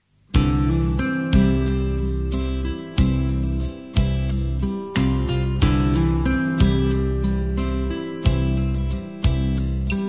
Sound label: Romantic Guitar